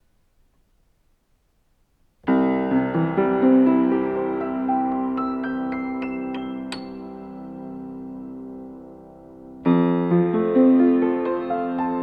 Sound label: Piano Open Lid; TBone Ovid CC100